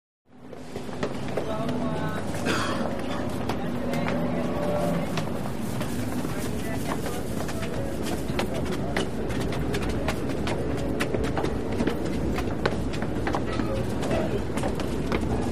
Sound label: Small Ext Stair FS Tr, stairs